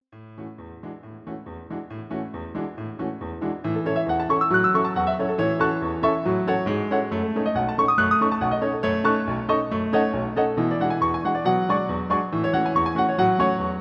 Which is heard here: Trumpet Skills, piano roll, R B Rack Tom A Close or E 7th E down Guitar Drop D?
piano roll